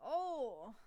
pain low; VIVAE

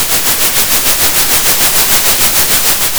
center, Sound of a train

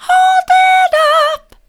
hold it up soft, vocal

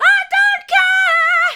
i don't care hard, vocal

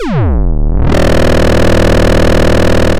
Sound of a chainsaw

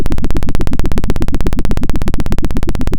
Sound of a helicopter

